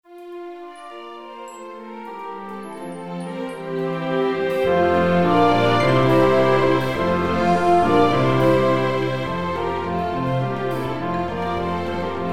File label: full orchestra